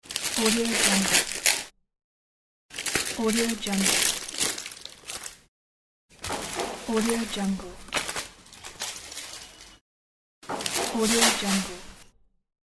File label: plastic bag sound effects